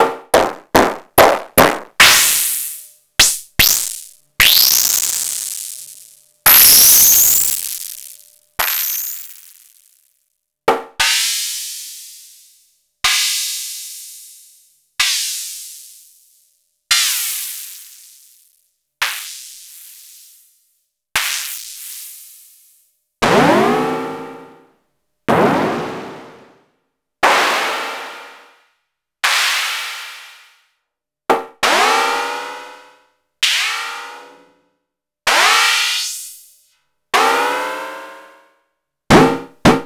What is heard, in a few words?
Short Hats